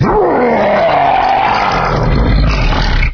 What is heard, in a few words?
voltigore idle